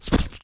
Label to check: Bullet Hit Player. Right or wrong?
Right